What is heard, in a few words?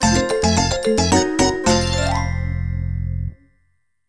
Jingle Subtitle